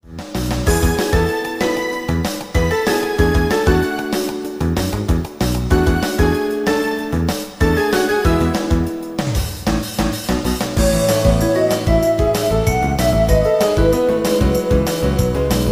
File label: relaxing ringtone